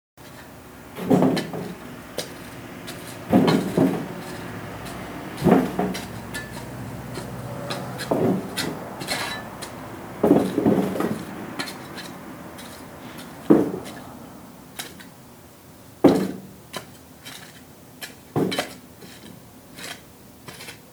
Schaufel

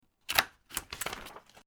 Locher

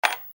Ring auf Tischplatte

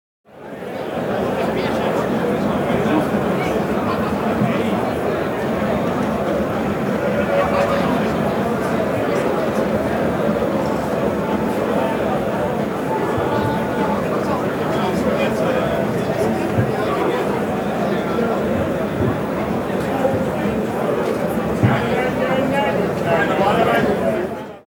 Stadion Atmosphaere

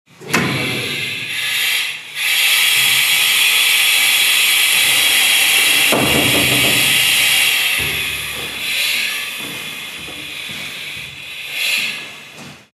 Feuerwehuebung Atmenluft

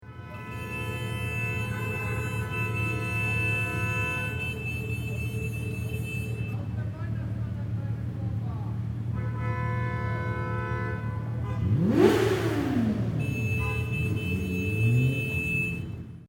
Silvester Hupen aufheulender Motor

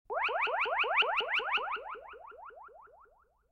Kosmische Klaenge Raumfrequenz

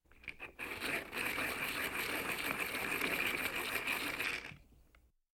Im Buero Manueller Bleistiftspitzer

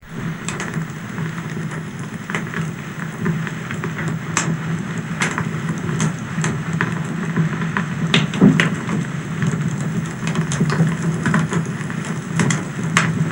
Feuer

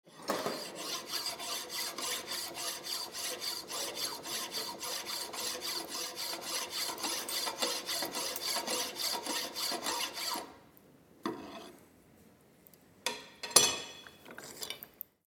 Feilen

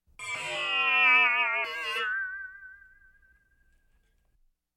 Laserschwert; Star Wars

